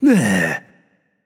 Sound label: nv tongue; smite; voicepack; hachiman